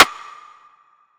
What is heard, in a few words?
Rimshot; Casket; Cymatics; Trap Starter Pack